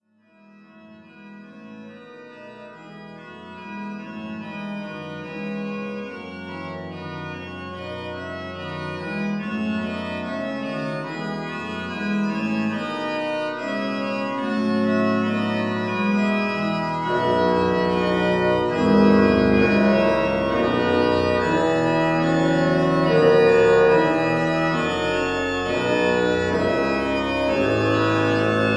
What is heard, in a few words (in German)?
Fuge zu 5 Stimmen, Es-Dur; Soiree 2018